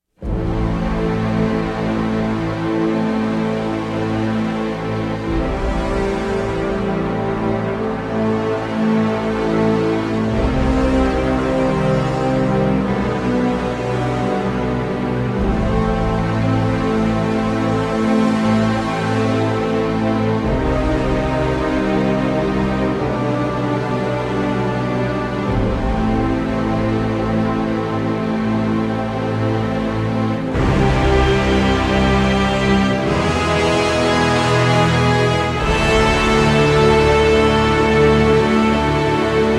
Instrumental

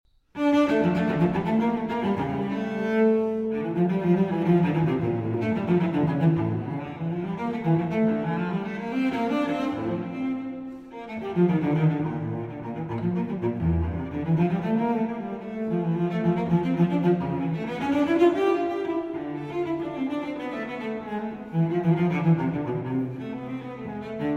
Cello Suite in D Minor; J.S. Bach; Cello Suite No. 2; BWV 1008

